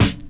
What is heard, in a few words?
snare, lofi kit, snares; x.shulz lofi kit